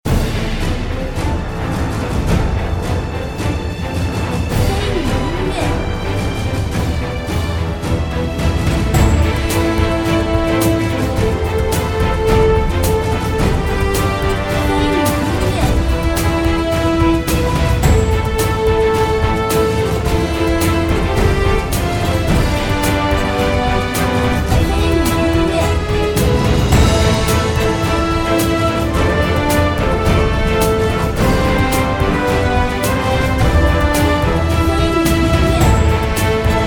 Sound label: battle